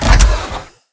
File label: Mvmt FS Land Default; AI Stalker; Titanfall 2